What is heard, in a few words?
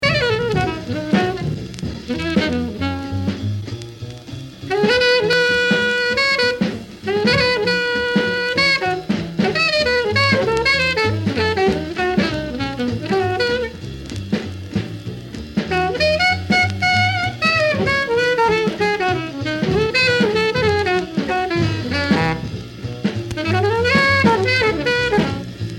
Blues Solo Late 1950s